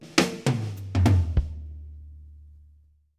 Fills 102; Zero-G; Monster Beats

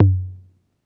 Tom Bounce; Lost Tapes From Mars

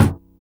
SURDO MUTE; Drums In Your FACE!

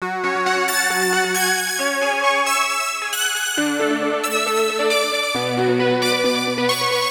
135 80S Lead; Zero-G Trance Inducer; 90 s Sample CDs

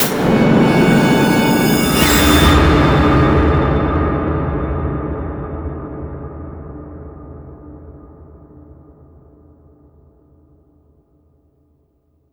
sci-fi sting; Spectrasonic Distorted Reality 1

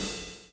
PULL; Spectrasonics - Backbeat

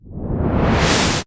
IMP WHOOSH

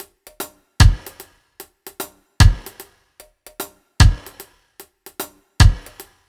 BT, Ragga & Dub Reggae

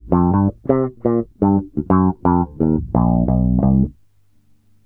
FUNKY 86 C, Bass Grooves; USB Soundscan vol.30; AKAI

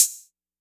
closed hat, Roland TR-808

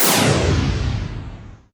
Misc Short FX

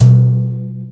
Yamaha EX-5

